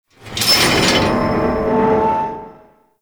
Sea Lab Hanging Chain; Warframe; The New War

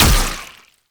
Incarnon Wrist Gun Alt Mech, Weapons; Warframe; Tenno

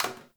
Janitor Plastic Spray Bottle; Warframe; Ambience; NineteenNinetyNine

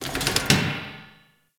Derelict Metal Creak Small, Interior; Ambience; Warframe; Orokin Derelict

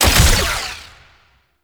Mech Omni Tool Start; Warframe; Nechro Mech; Abilities

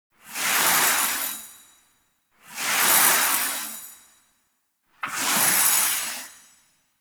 Foley Idle Rabbit Pet; Ambience; Gameplay; Teshin; Warframe; Duviri

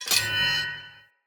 Curved Sword Whoosh Accent Heavy Start; Weapons; Player; Swords; Sinecure

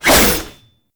Fist Claw Alt Whoosh; Weapons; Warframe; Tenno; Incarnon

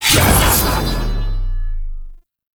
Backstab Cast; Warframe; PaxDuviricus

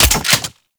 Pump Shotgun Mech A; Weapons; Warframe; Zariman

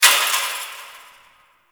Fence Rattle One Off

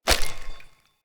Foley Melee Swing; Enemy; Characters; Corrupted Sproutfolk

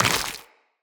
Step Goo, Footsteps, Walk; Characters; Tenno

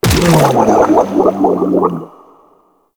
Inked Humanoid Large Death; Enemies; Warframe; Shadowgrapher

